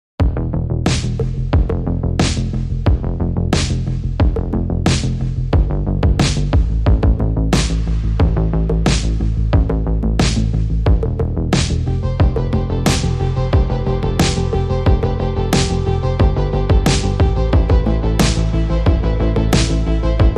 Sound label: No Lead Synths Mix